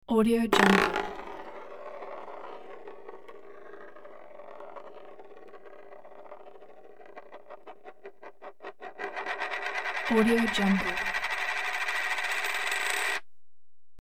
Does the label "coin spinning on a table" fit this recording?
Yes